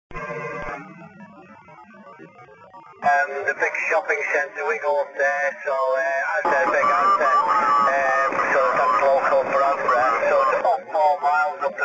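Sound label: 40 directivity stations reduction; SSB samples; 40 meters directivity and reduction of qrm from other stations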